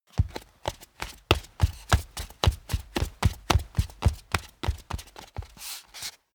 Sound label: step barefoot wood parquet run sequence; menschen; schritte